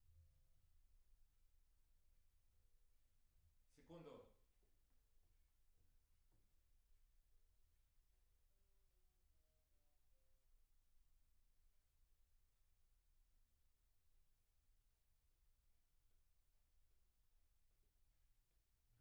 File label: PIANO